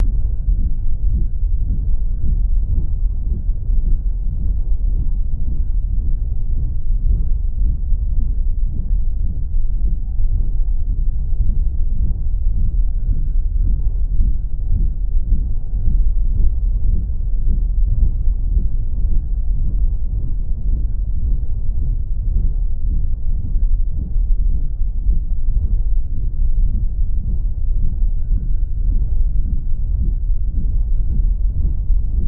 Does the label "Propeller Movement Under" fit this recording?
Yes